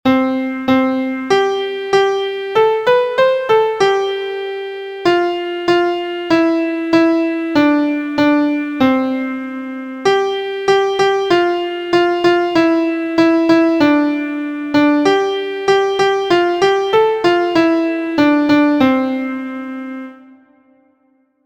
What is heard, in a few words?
MIDI